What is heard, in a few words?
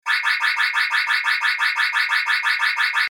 Alarm Fast High